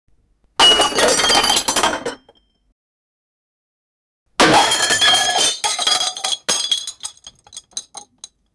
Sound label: Crash Smash Sound